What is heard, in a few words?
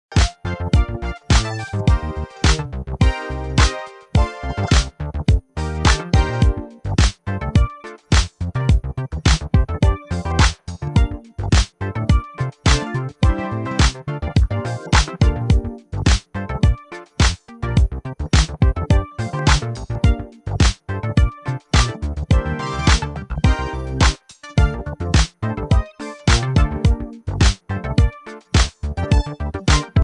glow rhythm